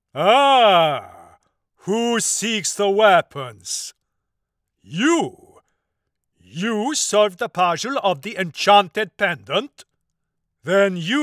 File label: Animation